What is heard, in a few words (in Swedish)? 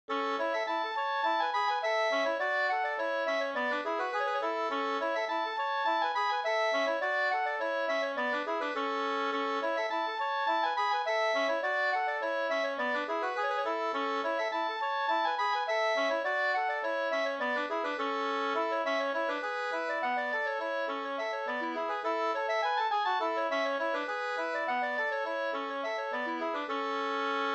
brannvinspolska, 2st